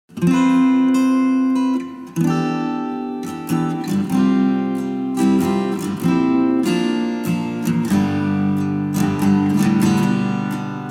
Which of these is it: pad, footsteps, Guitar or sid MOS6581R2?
Guitar